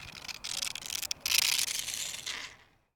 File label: Rustle Leaf Blow Across Ground; SFX Kit; Foley - Rustle